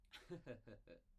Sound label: laughter; IEEE AASP